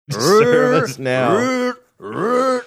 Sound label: making klaxon sounds